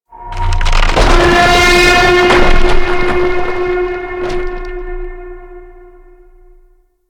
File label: Regrowth Orb Chatter; Props; Warframe; Ambience; Sentient Devourer